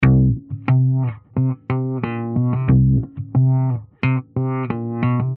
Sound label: Funk Guitars